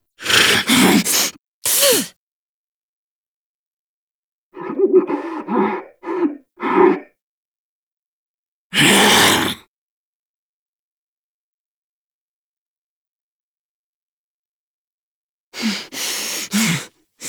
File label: Exertions; Good End; Eleanor; Warframe; Nineteen Ninety Nine; Quests; Hive Queen